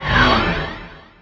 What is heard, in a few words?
skill bow range strike; FlyForInsanity